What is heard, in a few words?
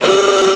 horn; Mafie 1